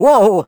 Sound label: ice slip; Crypt of the NecroDancer